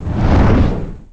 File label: Ogre swipe; Boss Ogre; Dungeon Defender; DunDef